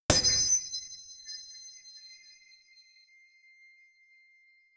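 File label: ammo pickup metallic click; weapons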